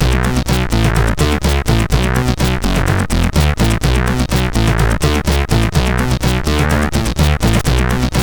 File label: Bassist, CSG8580; HVSC